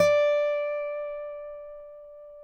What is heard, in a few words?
Finger D 5 L, Guitars; Spectrasonics; Hans Zimmer Guitars VOL-1